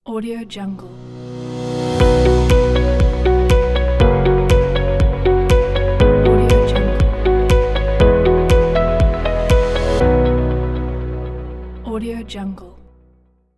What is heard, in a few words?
upbeat uplifting corporate logo